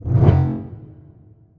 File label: Gravity On